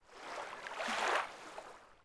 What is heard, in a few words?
Oasis Wave; Ambient; Civ4 beyond sword